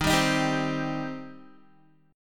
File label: Eb 6th Eb down Guitar; strummed